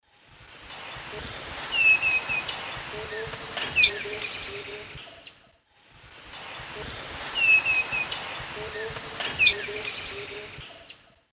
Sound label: Pachyramphus marginatus, calls; S. America; Eastern Lowlands